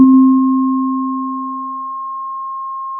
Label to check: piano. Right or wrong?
Wrong